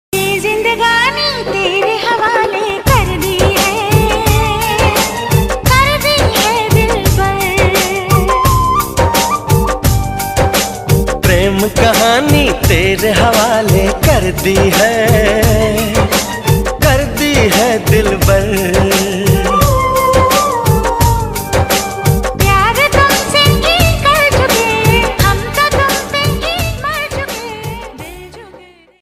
Old Bollywood Song Ringtone

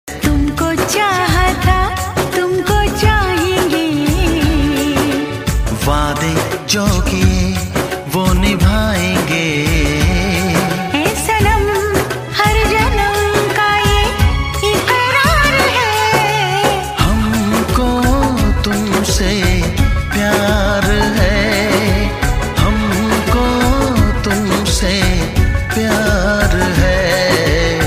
Old Hindi Song Ringtone

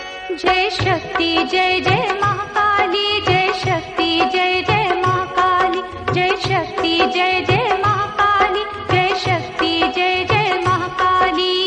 Maa Kali Bhakti Song Ringtone

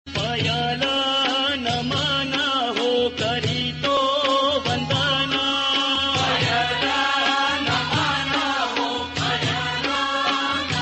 Marathi Ringtone

